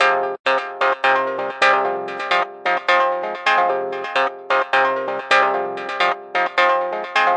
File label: colorful echoes arpeggio hi, synth and electronic loops